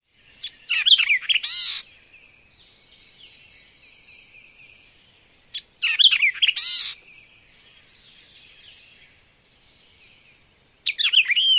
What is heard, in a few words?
song